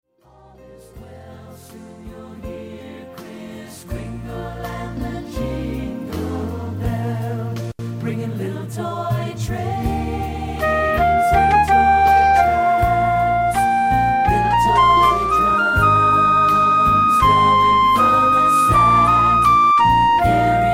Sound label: flute with track; Flute Part - With Vocals; Rivercrest Instrumental Music